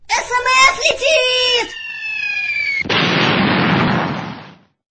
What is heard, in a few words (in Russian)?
priletel sms